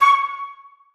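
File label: flutes staccato c5; Sonatina Symphonic Orchestra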